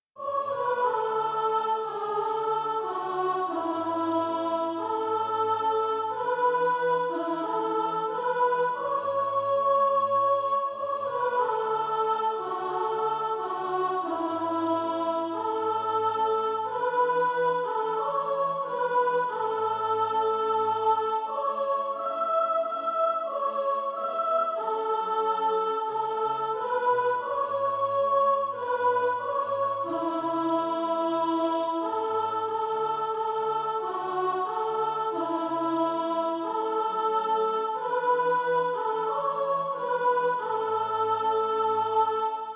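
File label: soprano, SATB Practise Music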